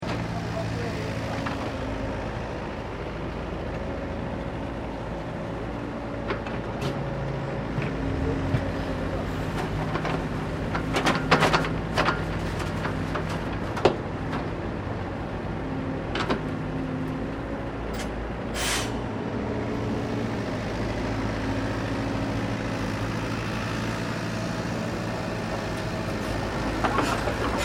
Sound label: Ambience Sannohe Road Construction Site Machinery Operation, Roadworks in Japan